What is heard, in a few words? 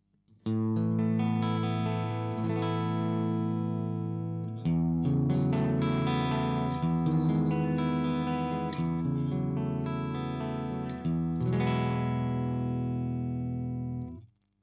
clean Position SM57